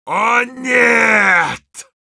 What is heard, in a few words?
Heavy negative vocalization, ru; О нет.